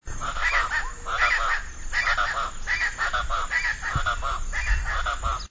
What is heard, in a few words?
Speckled chachalaca; پرنده گوش خراش خالدار